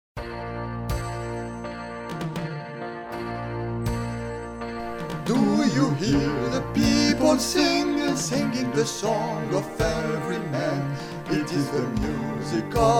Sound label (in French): 2 voix; chorale